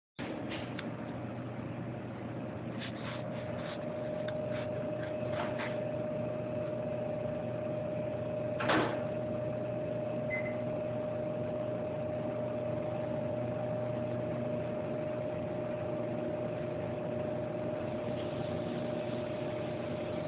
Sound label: snack, microwave; Dutch Treats